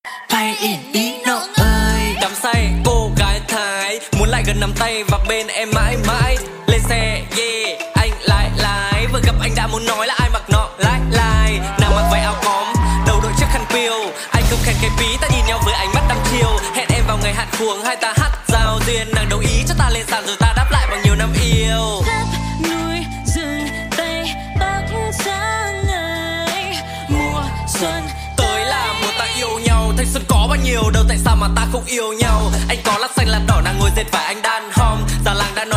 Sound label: rap